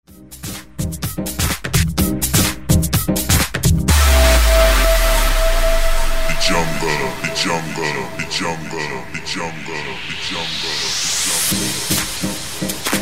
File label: Jungle Original Vocal Mix